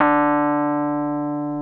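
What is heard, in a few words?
EP D2 FF, electric piano; Greytsounds VOL-1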